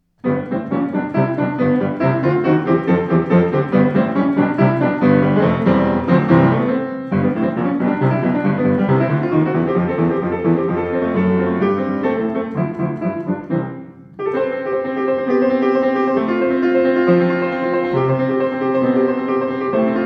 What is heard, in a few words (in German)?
Schimmel Rag, klavier; 112 schimmel schwarz poliert